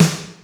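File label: Snare Wet Aggressive, Alesis D4 drum machine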